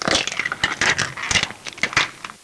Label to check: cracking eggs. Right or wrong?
Right